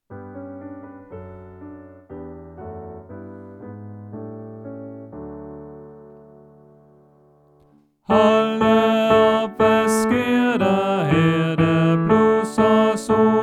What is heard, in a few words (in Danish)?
Tenor; Påske